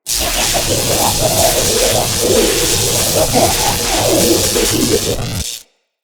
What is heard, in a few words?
Grineer Electro Death, Electrocution Death; Characters